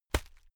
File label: hit plastic fleshy